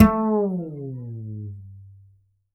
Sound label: Upright Acoustic Bass